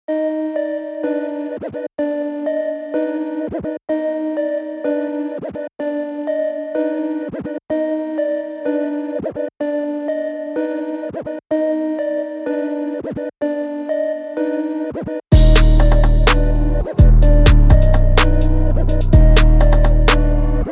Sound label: Instrumental